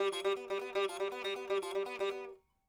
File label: sitar